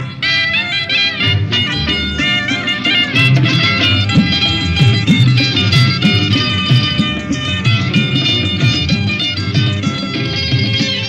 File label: Great Tone Tamil